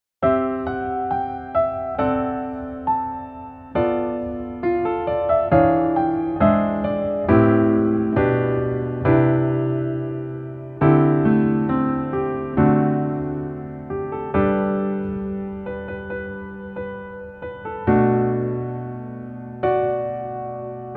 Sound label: Piano